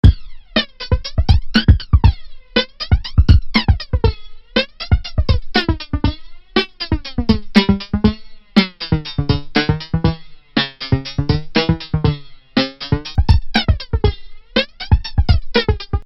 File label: flange